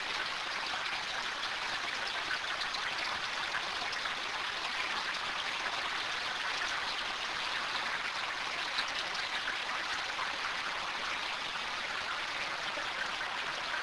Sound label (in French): temps pluie